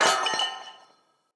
Corrupted Battle Dog Bell Swing Short; Foley

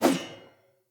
Sphere Polearm Whoosh

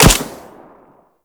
Grineer Shield Speeder Rifle Fire; Weapons

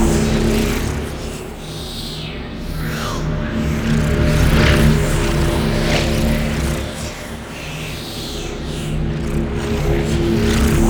Moon Energy Large Loop; Warframe; Ambience; Orokin Moon

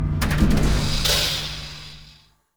Dojo Elevator Loop Shutdown; Ambience; Warframe; ModQuest; Teshins Hideout

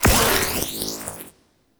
Corruption Pickup Hostile Small; Warframe; Zariman; Missions

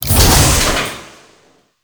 Door Open; Cinematics; Veso Intro

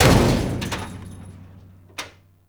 Destructible Metal Barrel; Warframe; Ambience; NineteenNinetyNine

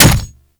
Claw Hit; Warframe; Grineer Manic; Attack

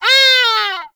Forest Animal Cries; Warframe; Grineer Forest

